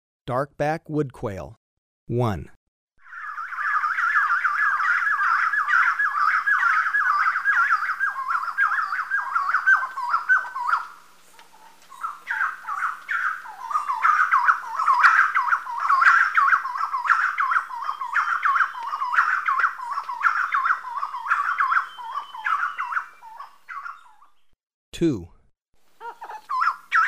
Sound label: Dark-backed Wood-Quail; S. America